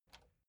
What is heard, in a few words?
metal button spring release